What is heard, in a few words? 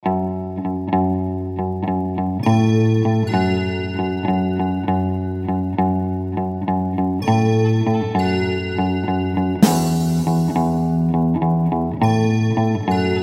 Instrumental